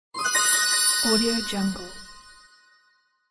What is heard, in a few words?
diamond bling